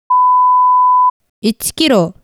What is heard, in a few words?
1kHz